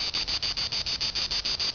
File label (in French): cigale; ambience